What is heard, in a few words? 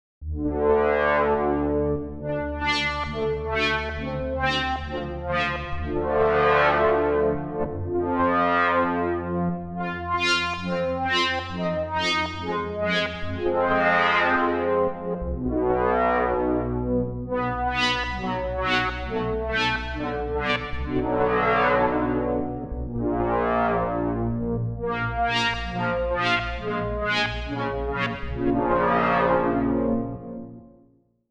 Rising Pulse